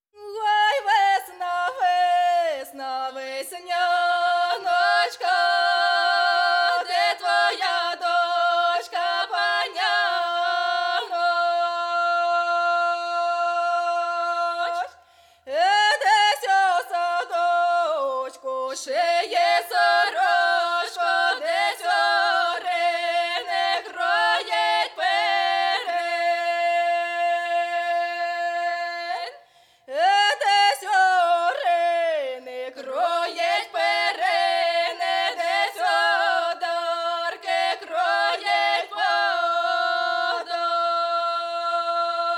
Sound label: vesnianka oj wesna